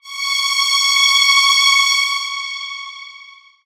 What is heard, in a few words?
A Str, Chords Tones; Equipped Music; Loungin House